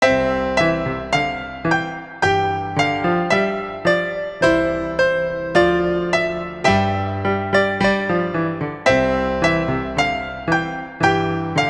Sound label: duet